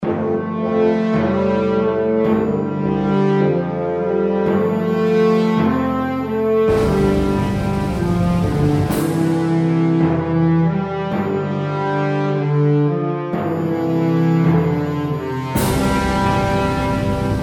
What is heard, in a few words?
orchestral doodle; lumbering beast